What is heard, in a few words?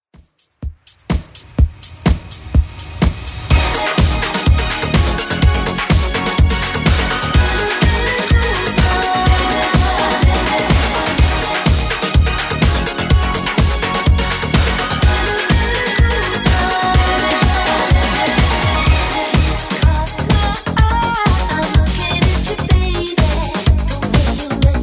80s Redrum 125bpm